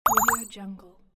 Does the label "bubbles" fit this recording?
Yes